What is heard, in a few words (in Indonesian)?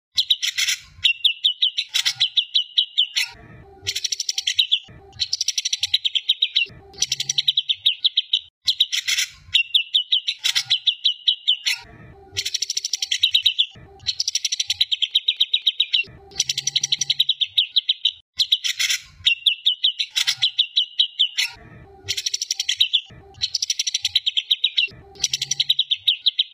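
cucak hijau isian cucak jenggot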